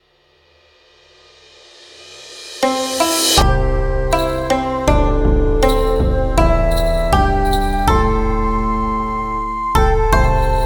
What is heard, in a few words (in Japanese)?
和ジングル